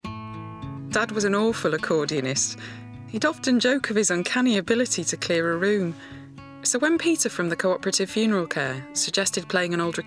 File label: Accordion; Co-op Funeralcare